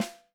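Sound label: 6X12 ALUMINUM; Living Drums; Sampleheads; Peter Erskine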